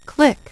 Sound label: click